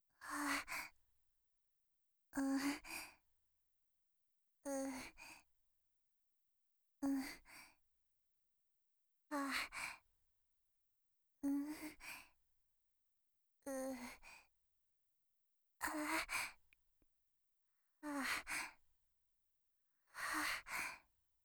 喘ぎ声 極小

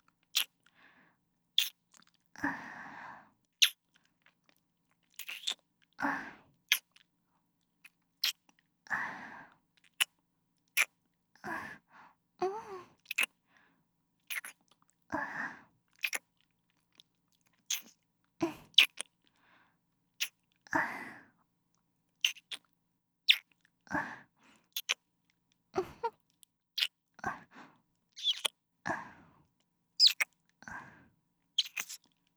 キス音

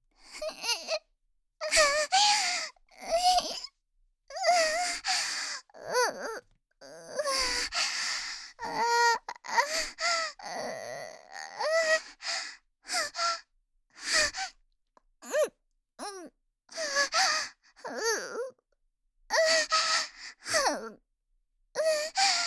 喘ぎ声(大)

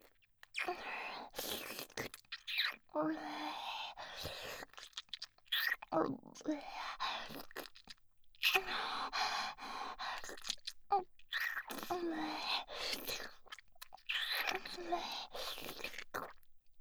ディープキス音

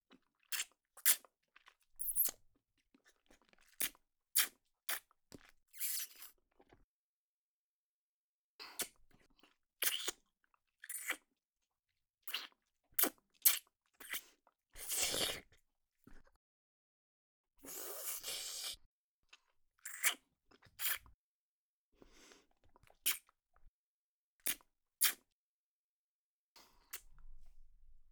咥えて吸う音(激しめ)水音のみ

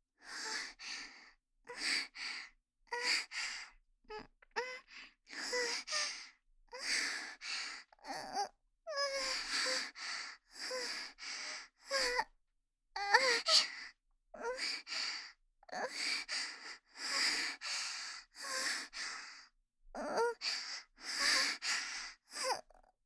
喘ぎ声(中)

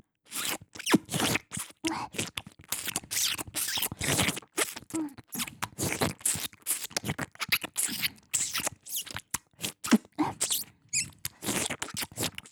フェラ 激しめ

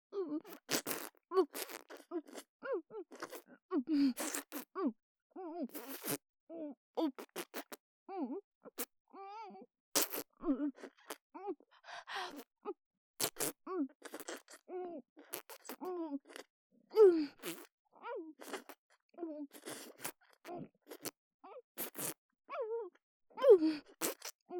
フェラ イマラチオ

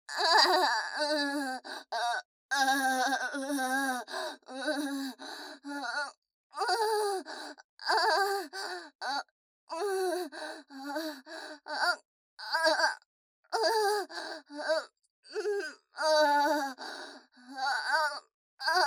喘ぎ声

